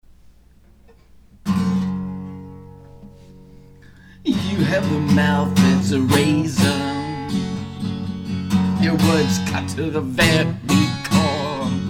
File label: Country Americana Soundcheck